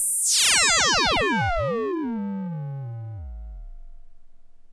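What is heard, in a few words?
resonance without vco, modsynth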